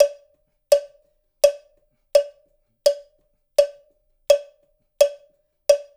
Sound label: percussion loop; InVision Interactive; Percussion Slam; CAFFEINE